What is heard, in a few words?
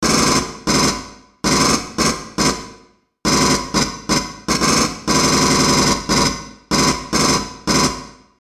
M41A Pulse Rifle; FXHome; Bullet and Gun